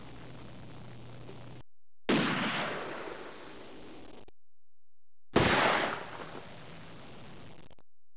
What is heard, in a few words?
GUN